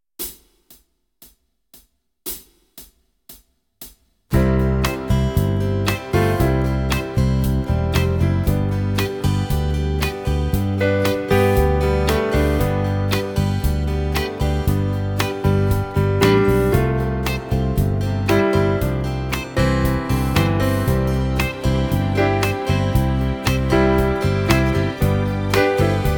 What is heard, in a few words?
Accompaniment; Kenneth Baker - The complete keyboard player